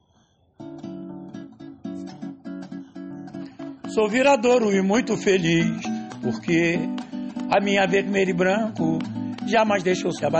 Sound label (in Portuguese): samba, cuíca